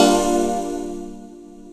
Vox Chord Gm7; Proteus 2000; Digital Sound Factory Proteus 2000

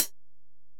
HiHat, Alesis DM-PRO

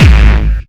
Big Nasty, Kick Drums; Zero-G; Soundclash

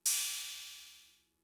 Cymbal, Fricke MFB-522, Drum Machines

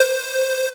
Synth, DnB One Shots

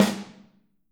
Snare OH; Snare OH All; Drums Percussion

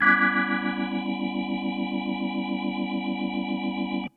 Cruisin' Disco Organ, 70s Analog Funk

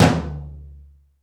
MPC1 TOM; Crypton - DrumBase5000; MPC DRUMS

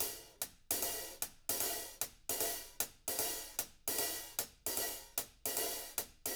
HIHAT LOOPS, Living Drums

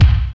KICK, Tekno Industrial; East West

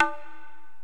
Korg x5, Drums

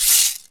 Shake; Mo' Phatt; Digital Sound Factory

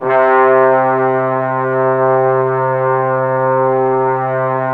Trombones, C3; Standard Instr

